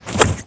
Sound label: divot; Jack